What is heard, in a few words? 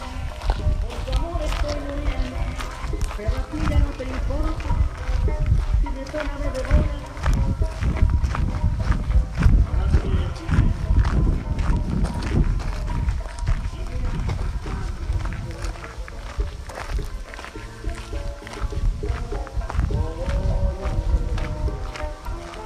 Spanish Music In Pavia